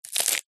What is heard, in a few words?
human eat bite crunch crispbread slice